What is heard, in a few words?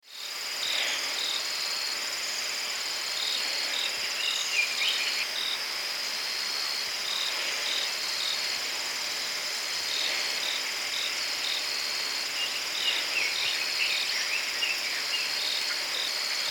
bird